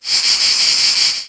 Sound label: far insects, ambient savanna; World of Tanks Blitz